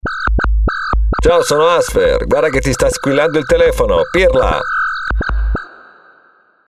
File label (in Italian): Suoneria